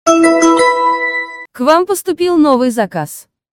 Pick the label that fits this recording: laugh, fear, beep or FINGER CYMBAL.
beep